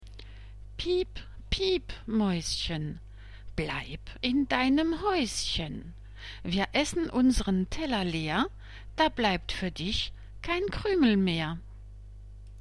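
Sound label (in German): Piep piep Mäuschen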